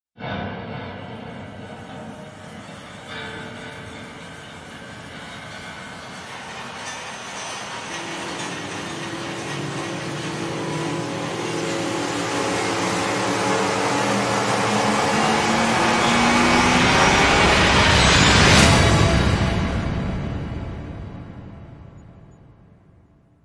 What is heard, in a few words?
Rise Panic; Brand X Music; Brand X Music Catalogue - Editor's Essentials